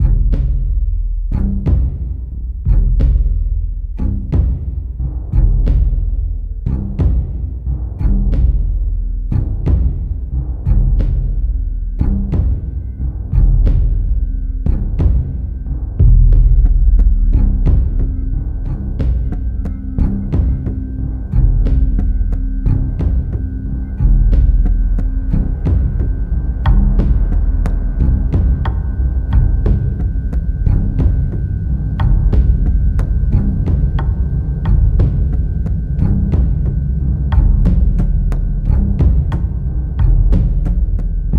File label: Loop Tense Loud; the walking dead michonne 1 in too deep